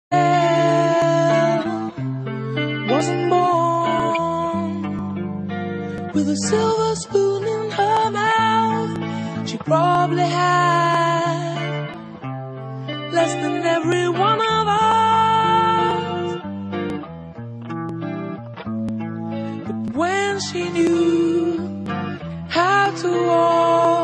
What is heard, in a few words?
ringtone